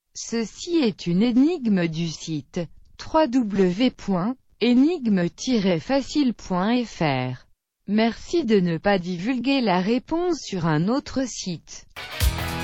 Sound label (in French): musique 5Hz